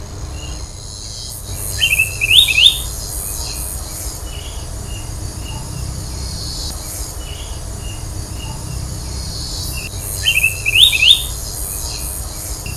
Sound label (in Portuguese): Soldadinho, Saltarín de Yelmo (Chiroxiphia galeata)